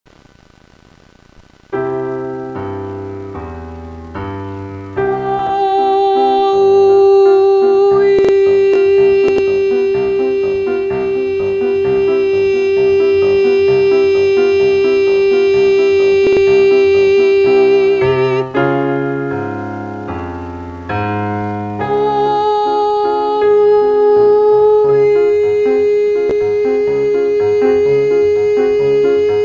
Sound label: Warmup, Vocalization